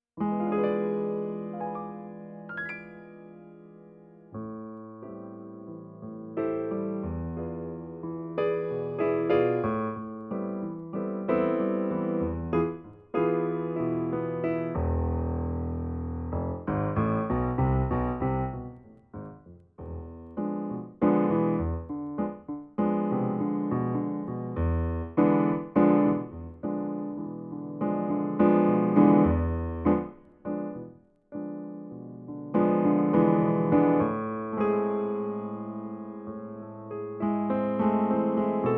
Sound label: Harmonic Minor